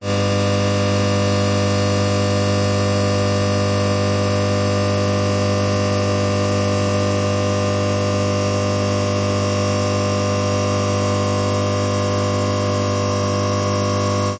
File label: industrial warehouse light buzz hum starter